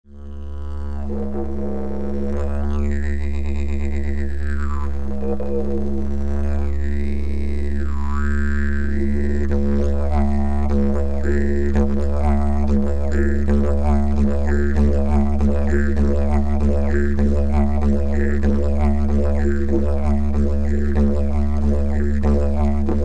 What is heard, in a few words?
leather, Didgeridoo aus Leder, Ton: ca. D; Ich habe ein Didgeridoo aus Leder gemacht...